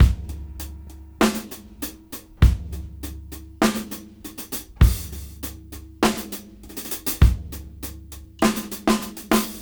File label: Half Funk 101 BPM